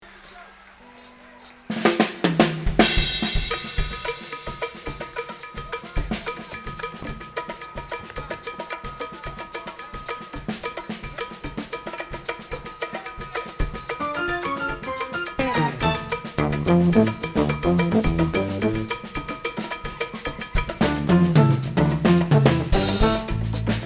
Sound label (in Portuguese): instrumental